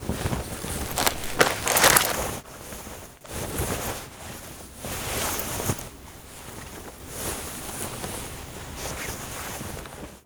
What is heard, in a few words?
humvee wounded loop; Modern Warfare